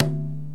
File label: INDIAN PERC